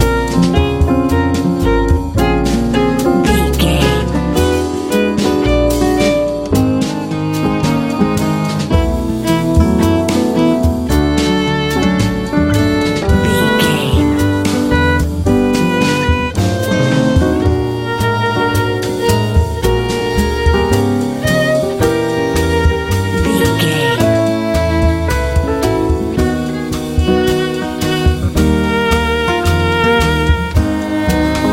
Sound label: nice powerful easy listening